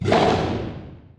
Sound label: injured